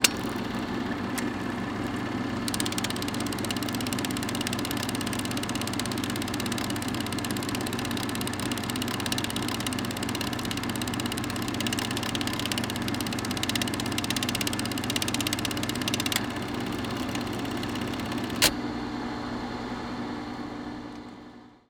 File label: projector spinning